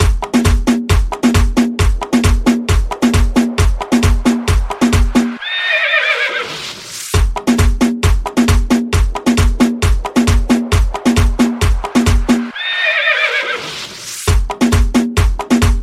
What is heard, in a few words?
latino horse ringtone